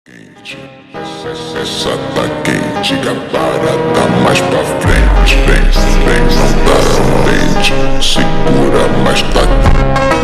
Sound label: funk slowed